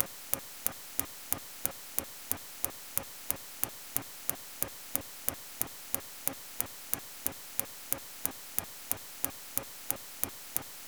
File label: SuperCam LIBS Sound MIC; ATLO; SuperCam Workshop Feb2020